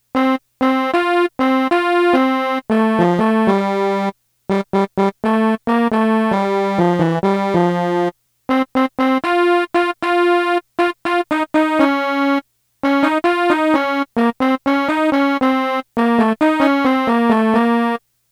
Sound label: melody; central songs